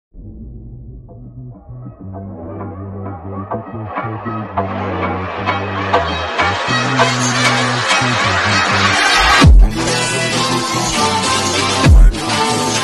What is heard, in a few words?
Brazilian Phonk